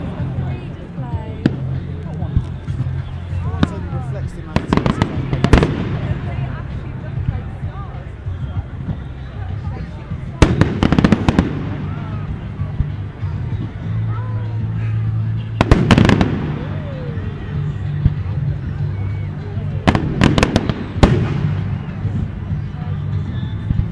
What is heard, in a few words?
firework